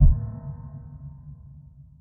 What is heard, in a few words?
fake Symbols Landed; asgardianstones